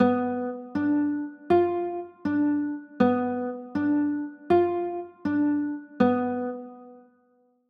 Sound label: b dim, chords